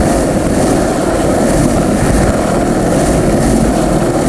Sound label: roll wood masonite, terrains; Tony Hawk's Project 8; XBOX